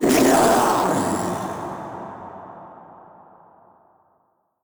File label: death; Crypt of the NecroDancer